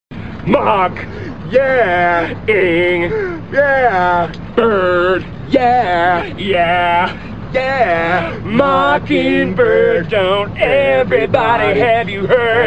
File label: Mocking Bird Imitation